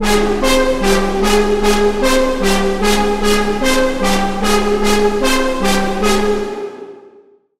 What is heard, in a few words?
Lead, Serum 2; Routed Sound; Serum 2 Expansion - Reality Maker Vol.1